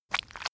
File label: step mud slow normal; schritte